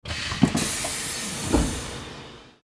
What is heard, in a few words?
train door opening